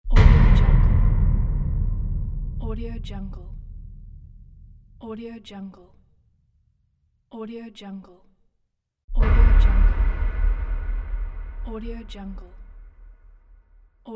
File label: bass impacts sound pack